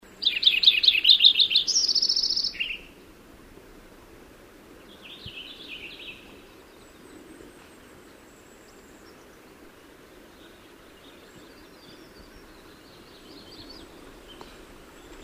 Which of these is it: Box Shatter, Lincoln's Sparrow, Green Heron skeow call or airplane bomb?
Lincoln's Sparrow